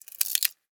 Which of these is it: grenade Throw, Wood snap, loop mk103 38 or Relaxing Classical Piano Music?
Wood snap